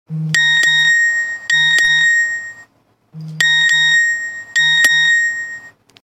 Messaggio Nokia

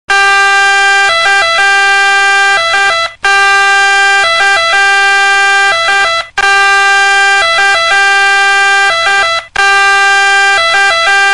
Ambulanza

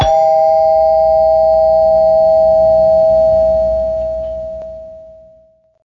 Campanello Di Casa

